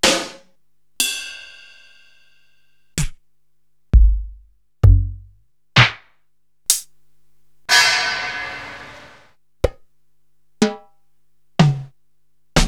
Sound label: Korg TR-Rack, Samples Sliced; m8